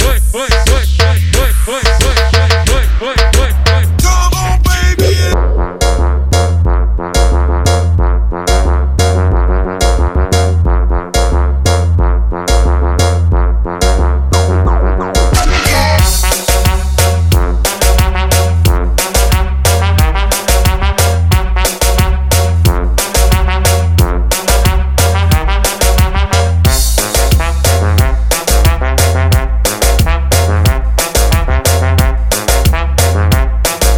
trompete mix

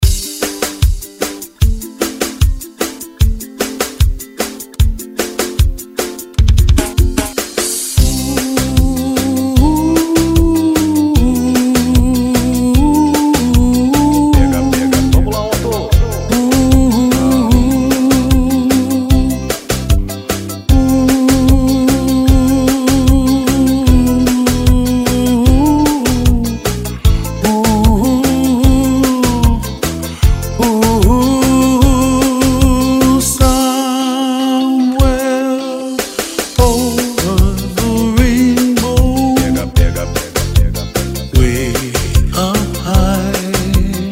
BREGA NA BATIDA 150 BPM

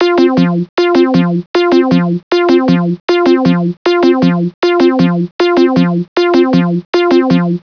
sint Loop

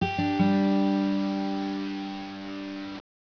guitarra

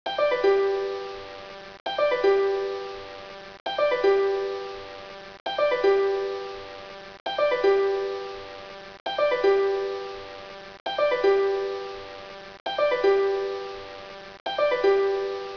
harpa Loop

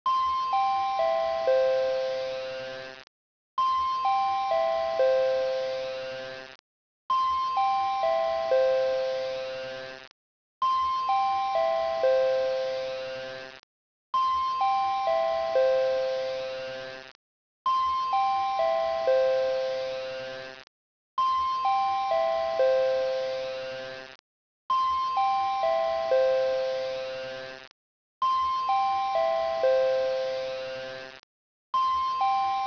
hipermercado Loop

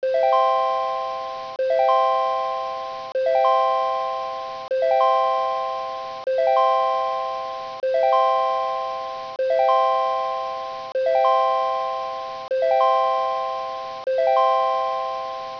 triangular Loop

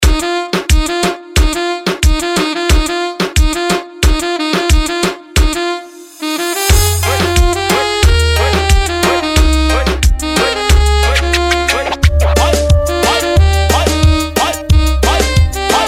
REMAKE DO SAX

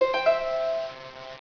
harpa

